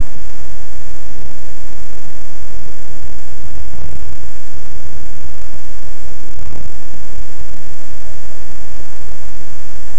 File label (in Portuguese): sons de sussurros muito, low frequency hum